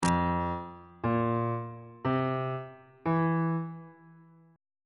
e sharp major 7th suspended 4th chord intervals on bass clef